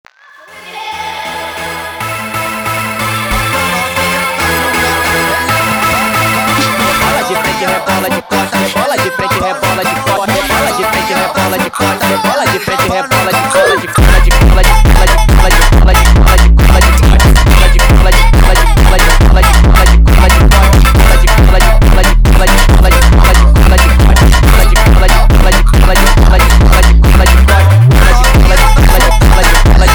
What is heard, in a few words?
Funk Sped Up